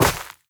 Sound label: step run, footsteps player grass